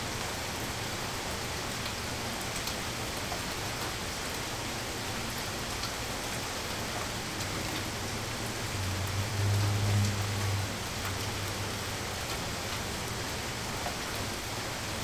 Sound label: Toronto rain occasional traffic